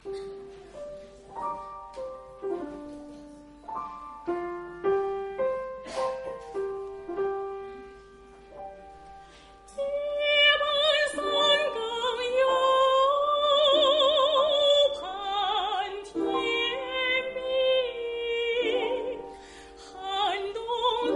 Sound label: folk song